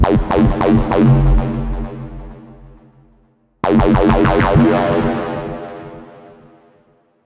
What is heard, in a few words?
New instance of Hive with bandpass filter